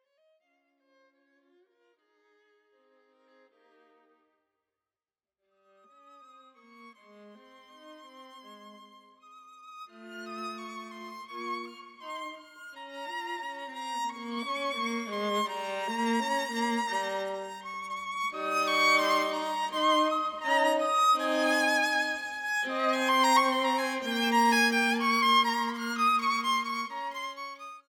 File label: adagio ma non troppo; alessandro rolla